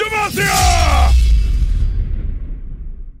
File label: ult sound; garen demacia